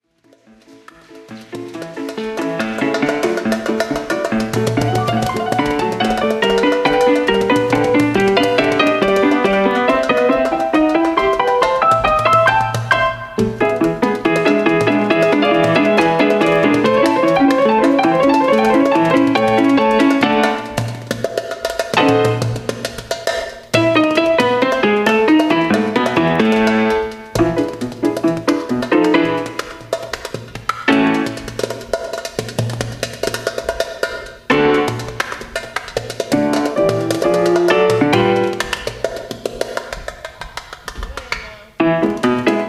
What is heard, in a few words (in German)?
tasten und percussion